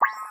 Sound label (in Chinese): 点击音效; 大家来找茬